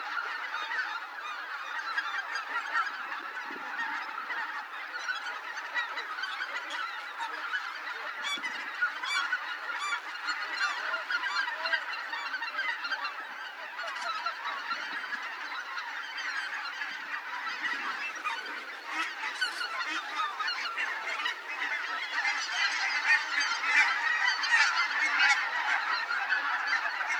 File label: Greater White fronted Goose Anser albifrons; geese